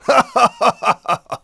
laff; deathlegends

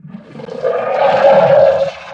sucker growl, creature; stalker

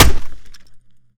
keyboard hit, weapons, melee

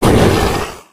hit, creature; stalker

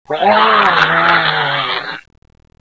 alert

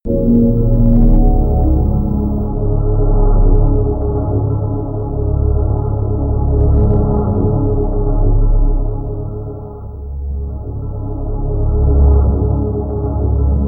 forest trail; deathlegends; halloween

